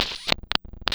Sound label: Squeaky Clap; Cwejman S1; DevSnd Cwejman S1 - JK